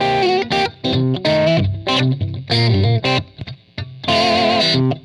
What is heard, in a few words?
Dist Chunk Funk; Sonic Foundry; Paul Black